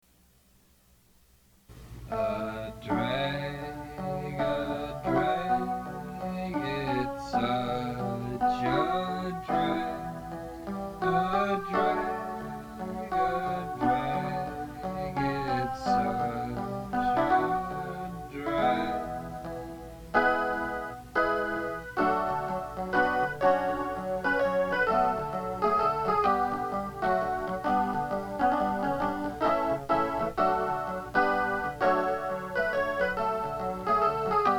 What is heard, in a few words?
a drag chorus